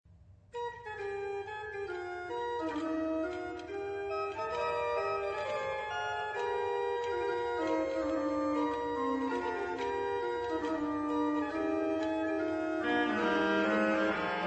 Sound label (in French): basse, orgue; Lebegue